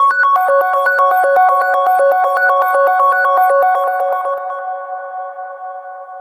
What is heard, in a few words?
orion, phone; rphone